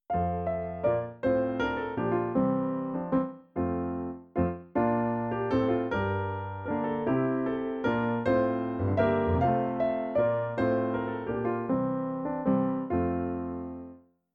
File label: Accomp; Act 68 All must leap